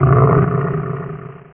roar eko

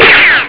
fight

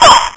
SHIELD

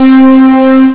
BEEP; GREAT ACTION; SKIDMARKS 2

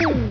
GUN; ASTRO256

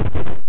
puny exp

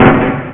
SHOTGUN

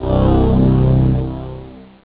Fight

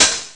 TR909 HHO

